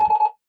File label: beep Quick BIPBIPBIP